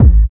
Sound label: fluffy kick single shot g sharp key